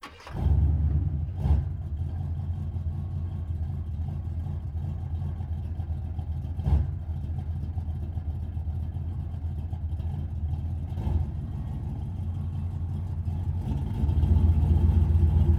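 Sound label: Muscle Car Start Reverse Slow Out; Vehicles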